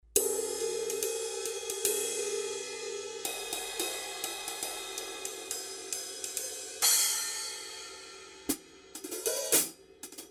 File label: overhead cymbals